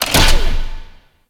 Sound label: Shell Pillar Spin Stop; Warframe; Corpus; Railjack; Comet Shredder